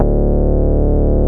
BAS Mini, BAS1SYN; Best Service Gigapack 2

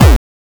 good for speedcore, kick

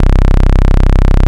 MONO POLY

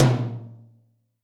tom, Zoom MRT-3B

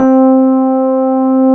suitcase; Keyboards Old School; USB Soundscan vol.09; AKAI

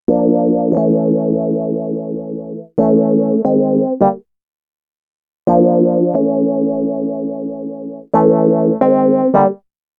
Keyboard

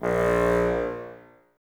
Bassoons A#1; Miroslav Vitous - Woodwind Brass Ensemble

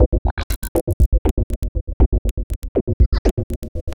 Subtractive Beat; Sonic Foundry (Sony Creative Software)

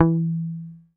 MUTE E3, Bass; Sampleheads; Will Lee Bass Library

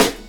KIT, Dance Mega Drums, HIP; Best Service

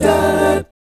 F13, GUY CHORDS, Vocal, Jazz; ILIO; Vocal Planet VOL-3; Jazz & FX

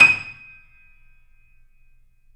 D#, The Piano; InVision Interactive

